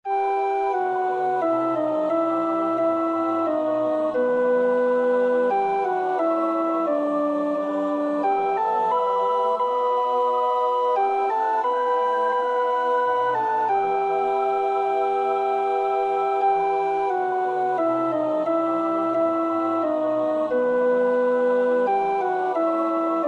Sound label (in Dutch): alt, koorpartij oefening; Bach (Johann Sebastiaan)